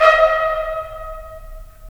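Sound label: brass trumpets staccato, left; Roland LA Composer vol. 4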